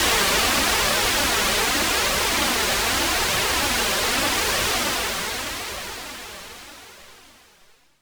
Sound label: Juno 60 Raw, White Noise Raw; noise hiss crackle